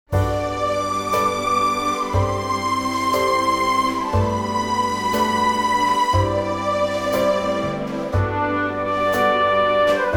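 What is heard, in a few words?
G orchestral; key - G - vocal range - G to B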